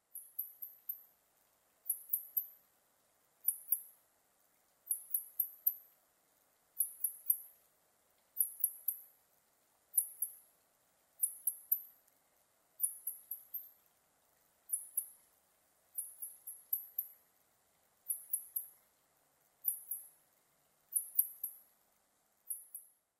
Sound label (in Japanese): koumori